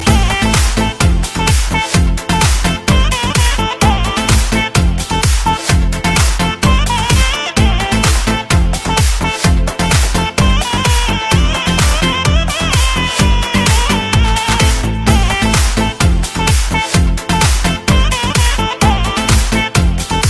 House

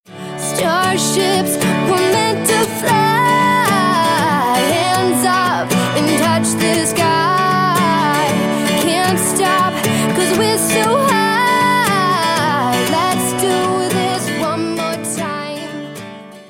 Acoustic

